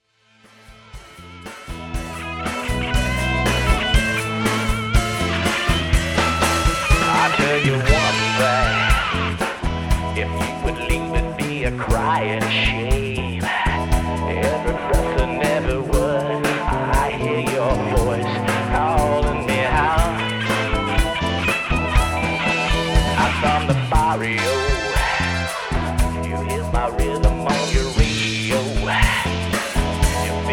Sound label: cover band